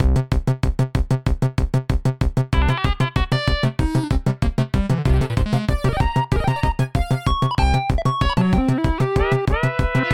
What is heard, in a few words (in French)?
vroum vroum; excursion